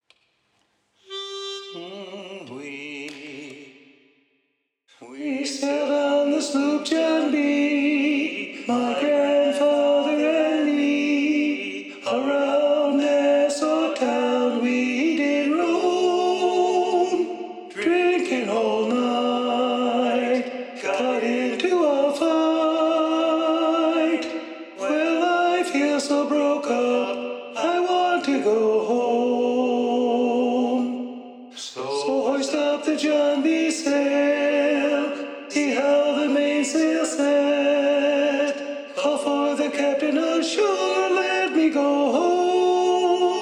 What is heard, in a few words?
Tenor Predominant; sea shanties; Sloop John B